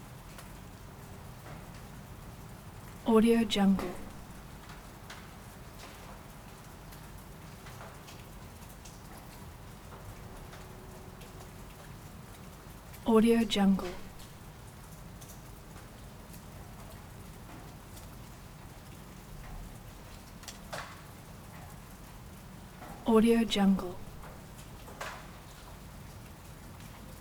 ambience small town night rain

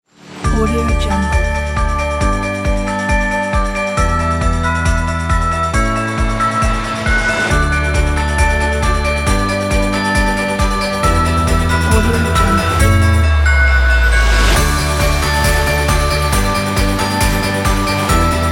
christmas rock news

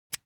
object socket pull out usb cable

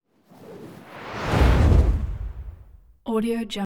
whoosh slam whoosh bys doppler

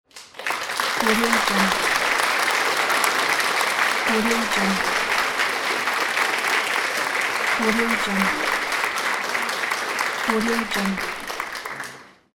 claps

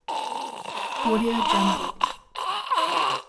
male choking pain

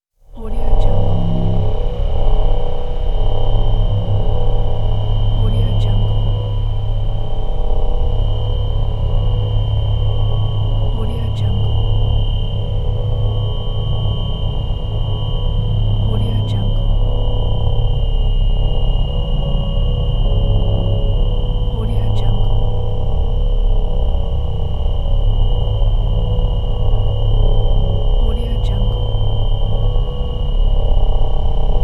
scifi ambience

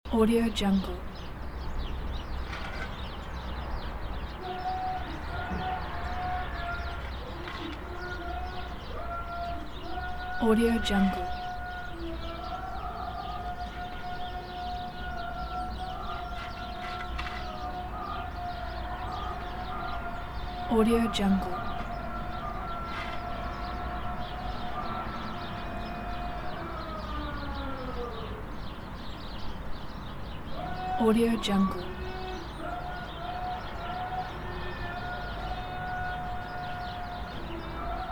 muslim town ambience loop; fxprosound

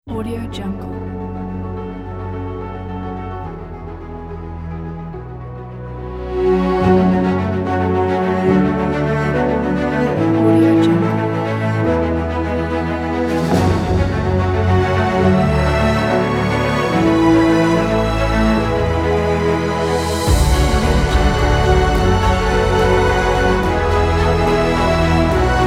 uplifting victory epic trailer

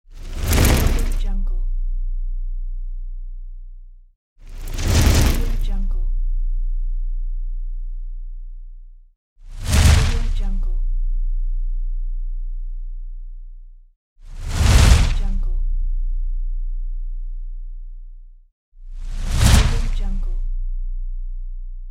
fireball pass; umcsound

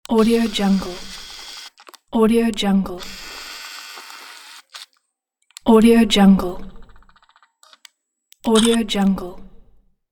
high quality camera autofocus and shutter

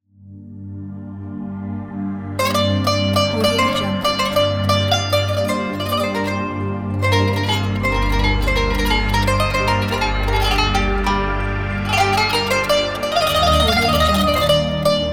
middle east kanun taksim

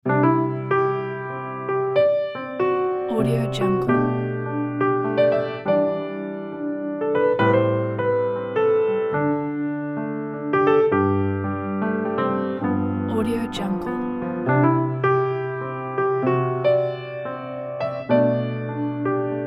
in a tender way

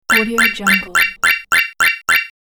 tally your score

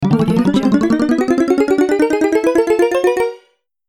energy power upgraded

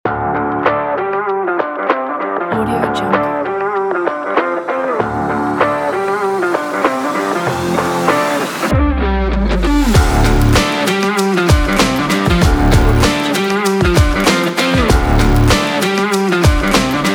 stylish hip hop rock

